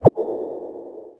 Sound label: nav signal short